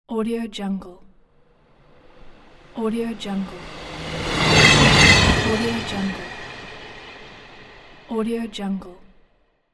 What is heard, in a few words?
train pass by